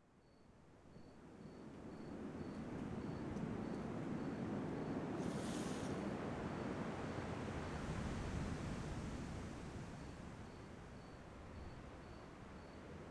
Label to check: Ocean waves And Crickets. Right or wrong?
Right